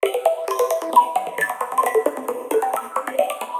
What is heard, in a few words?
lead, Melody Loops; TRUTH-HZ; Crowdsourced Sample Pack